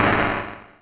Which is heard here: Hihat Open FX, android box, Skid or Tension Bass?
Hihat Open FX